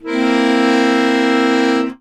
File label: AKORDE C 7; ACCORD.CHRDS